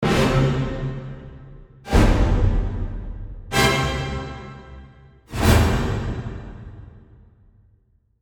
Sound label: pure space symphonic hits